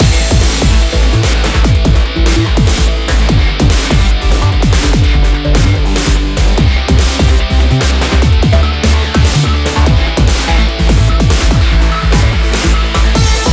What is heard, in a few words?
Bgm Bomb; Mahjong Fight Girl (Arcade) (gamerip) (2023)